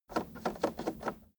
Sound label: car temperature knob turn short slow